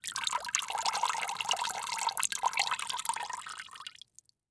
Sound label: Liquid Water Dripping Into Water In Plastic Bucket Short Slow